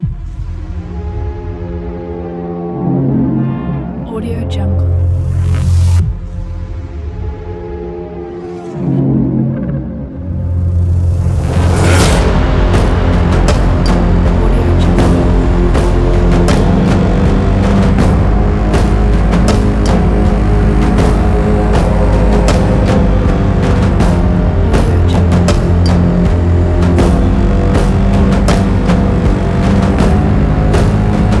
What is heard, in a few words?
angry vikings trailer